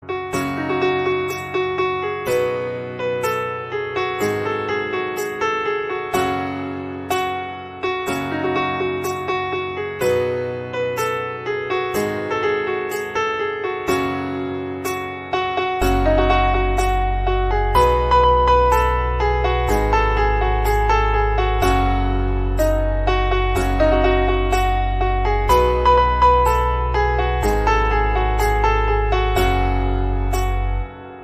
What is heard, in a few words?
Instrumental Piano Version